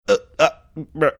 imitating sound of conversation over flake connection